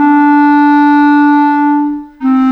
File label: Clarinet; Sonic Foundry (Sony Creative Software); Orchestral Series VOL-4 - Rock and Pop